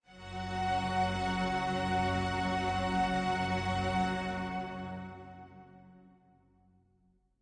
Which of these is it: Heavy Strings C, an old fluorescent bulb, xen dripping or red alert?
Heavy Strings C